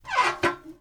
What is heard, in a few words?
DOOR Metal Hatch Open Creak; mono; Universal Sound FX; DOORS & GATES